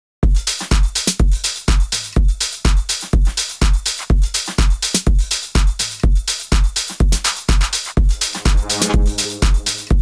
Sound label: Groove; Rhyhhm BG